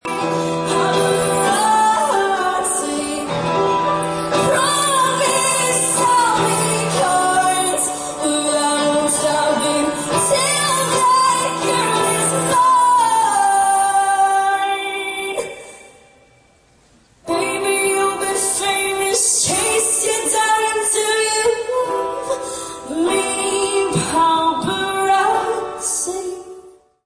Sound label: singing